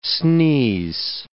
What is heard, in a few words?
sneeze